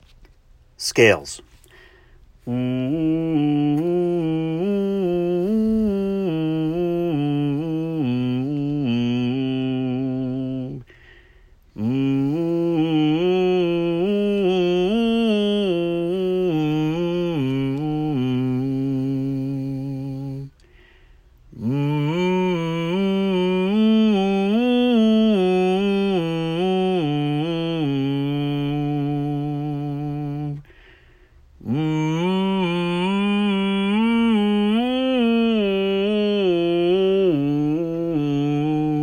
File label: Scales